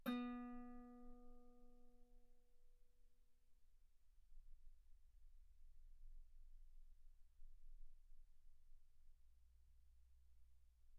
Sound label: Guitar ff B3; sul B